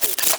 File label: Screen Glitch; Warframe; Caliber Chicks Minigame